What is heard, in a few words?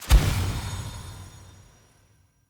UI Default Conversation Menu Select